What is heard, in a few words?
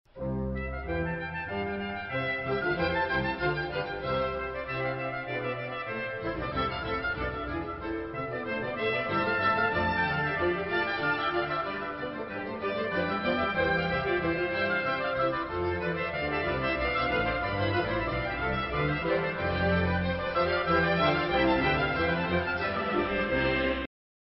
TRUMPET